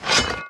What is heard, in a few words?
draw axe; Worms3D